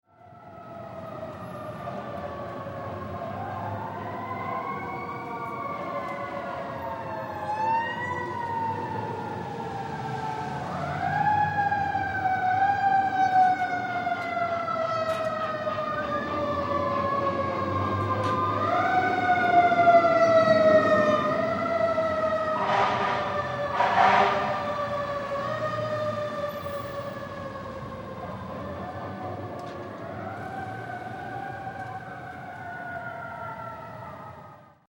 Sirens City Street Ambience, Multiple emergency vehicles, sirens; Sirens City Street Ambience: Multiple emergency vehicles, sirens reverberating throughout cityscape. Fire, police, paramedics; City Sound Effects; Lynne Music